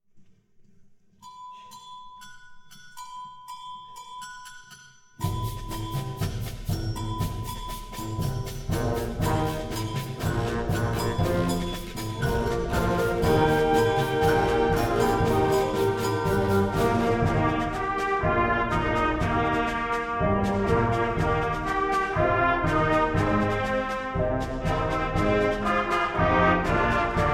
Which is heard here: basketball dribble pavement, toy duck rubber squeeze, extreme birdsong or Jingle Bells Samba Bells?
Jingle Bells Samba Bells